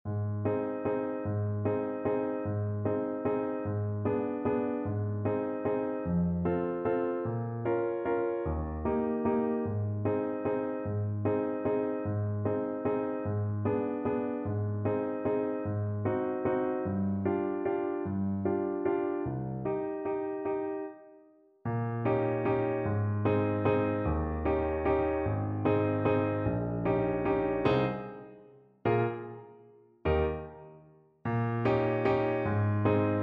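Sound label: waltz, alto saxophone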